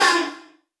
Vocal Oneshots; Vengeance Samples; Vocals

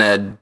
Synth Voc; Vengeance Samples; VEE Synth Shots

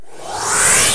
Sweeps Short

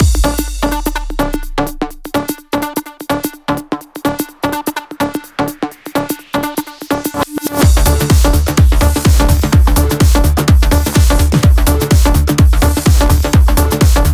Mix, 126BPM; Vengeance Samples; Thommy Kit

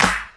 Claps & Snares

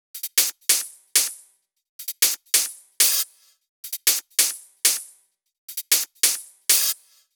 130BPM Kit; Vengeance Samples; Take Top Kit

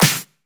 Snare; Vengeance Samples

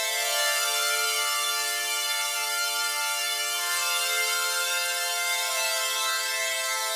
138BPM Melody; VFH1 138BPM The Epic Kit

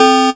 Synth Shot; Vengeance Samples

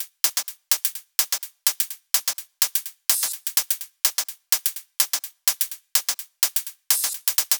126BPM Kit; Vengeance Samples; VFH2 126BPM Secrets Kit

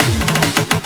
Fill 140 BPM

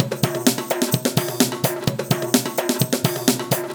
Latin House Loop 128 BPM; Vengeance Samples

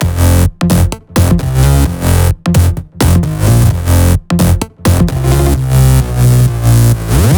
130BPM Jig Melody; Vengeance Samples; DK Jig Kit

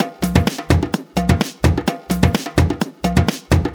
Percussive Loops 128BPM; Vengeance Samples

